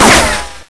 cement, debris

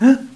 startle; cstrike

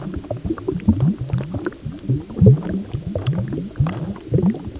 sewer; octaved

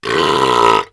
blurpy

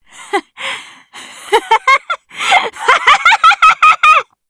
cheer, voice commands; svencoop; Blanc

